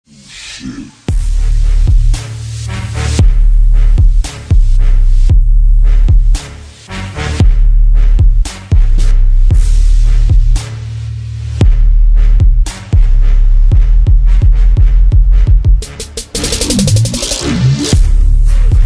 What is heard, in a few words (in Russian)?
super bass rington lucsij bass v mire